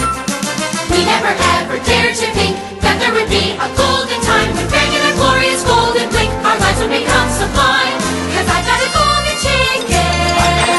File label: golden ticket vocals